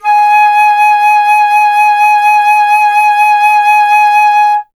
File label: flute G#; Miroslav Vitous - Solo Instruments VOL-2; Solo lnst. II